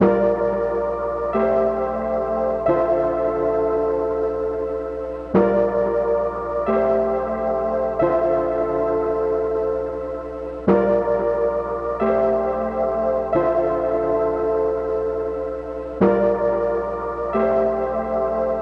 90 BPM D Min Prophet 5 Chords, Synth; Loops; Loop Stems; ARP+JU60+P5; Cymatics; Fantasy Synth Sample Pack; Good Life

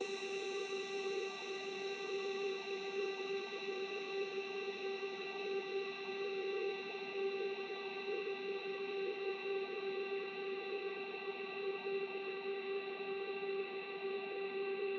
128 BPM E Min Ambience; House; Loop Stems; Cymatics; Breakdown Loops; Goliath

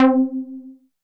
Moog Model D PLUCK Alloy Low - C; Synth; One Shots; Cymatics; Fantasy Synth Sample Pack

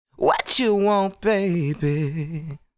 Sung Phrase Dry, 105 BPM D Min; Whatchu Want; Cymatics; Euphoria Vocal Sample Pack

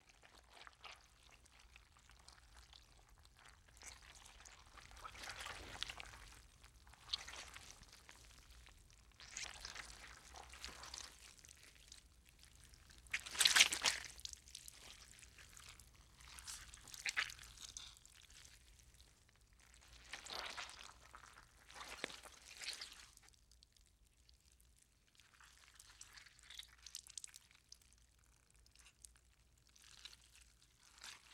Blood Slime Presence; Fairlight Sound Lib

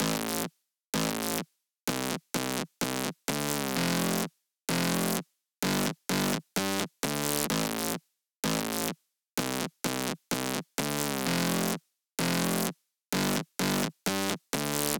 128 BPM A Min Bass; Drop Loops; House; Cymatics; Paradise

